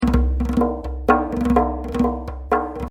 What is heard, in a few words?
6 Beat 3-8 - 84